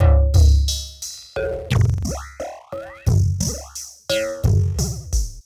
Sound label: Looney Groove, Beats; Sonic Foundry (Sony Creative Software); Ma Ja Le Ethereal Textures